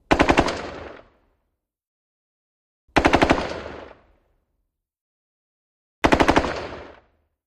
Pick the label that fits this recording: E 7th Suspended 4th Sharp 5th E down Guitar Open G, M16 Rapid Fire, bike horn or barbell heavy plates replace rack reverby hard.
M16 Rapid Fire